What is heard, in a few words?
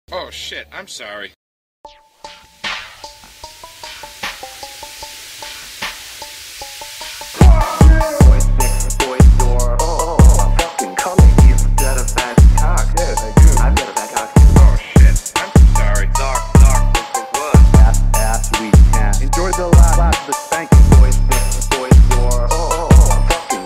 PHONK; GACHI